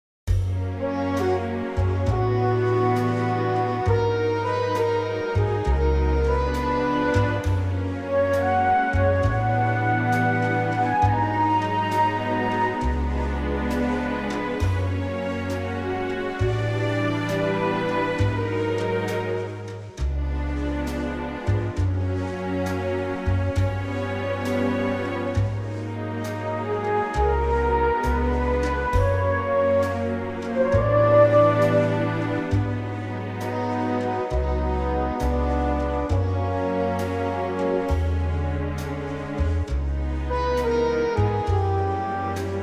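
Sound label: Gb orchestral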